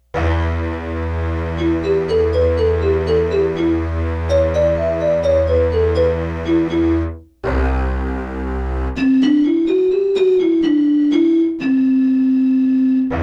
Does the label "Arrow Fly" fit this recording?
No